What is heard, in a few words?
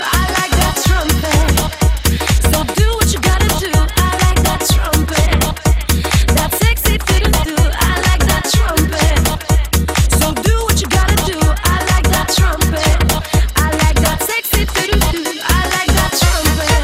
I Like The Trompet